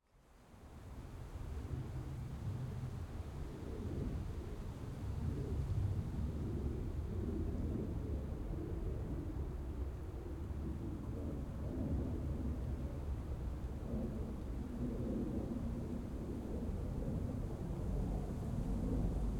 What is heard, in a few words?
Jet - Airliner - Fly - By - Overhead - Distant - Some Leaves and Wind; Pole Position; The Aircraft Ambient Library